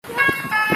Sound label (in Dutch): Deursluittoon Metro; GVB